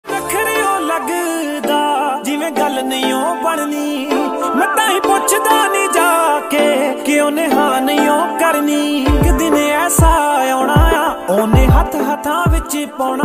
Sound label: Trending Punjabi Ringtone